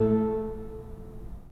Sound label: 3D Pipe Mix Celeste, 3D Organs